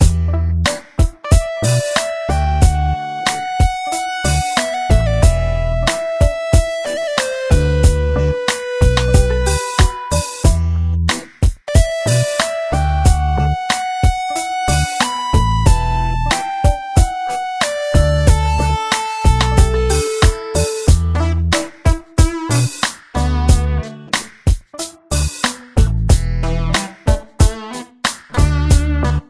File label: Hip Hop; Recall